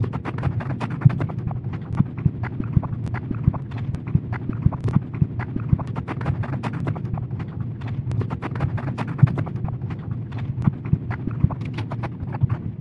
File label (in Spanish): neumatico pinchado